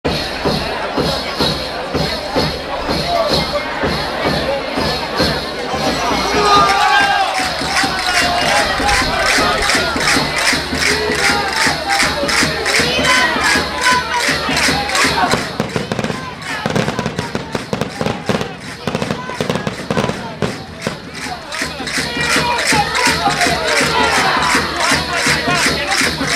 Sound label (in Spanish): Folia Entrada